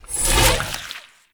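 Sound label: Launch Shroom Projectile Whiz By; Warframe; Nokko; Launch Mushroom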